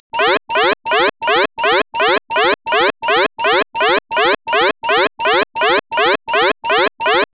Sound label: Modern Alarm